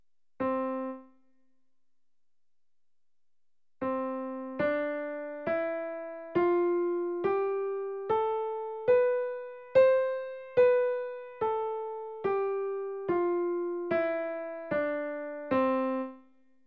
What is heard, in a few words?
Major Scale starting on C